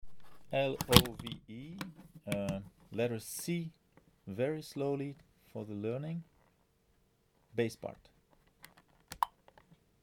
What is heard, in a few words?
slowly Basses